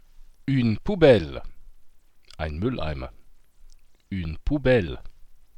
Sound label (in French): poubelle